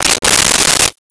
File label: Tape Measure Opened; Tools